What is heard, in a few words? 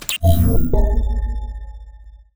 teleport activated; pulse